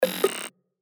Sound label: Incorrect